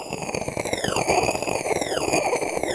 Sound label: Water Bubbles Slurp Bong Loop; SFX Kit; Foley - Misc